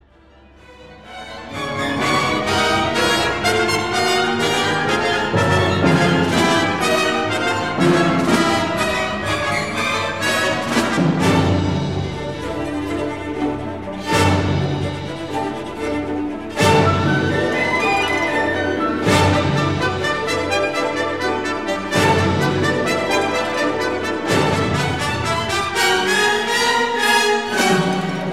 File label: Orchestral Bassoon; Nightingale 2; Columbia Symphony Orchestra; Craft; stravinsky